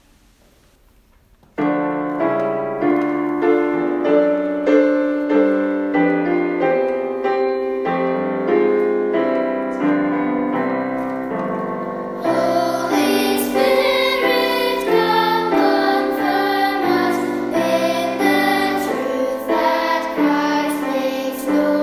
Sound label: choir